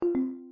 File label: leave tone; xuanxuan; zentaopms